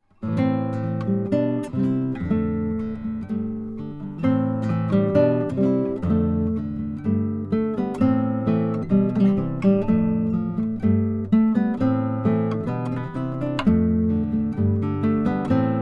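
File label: Brazilian rosewood classical guitar; 1984; langejans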